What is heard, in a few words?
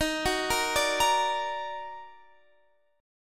D sharp Minor Major 7th; arpeggiated